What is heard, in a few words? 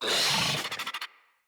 Infested Charger Attack, Enemies